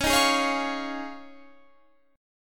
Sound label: F Minor 7th Sharp 5th, Db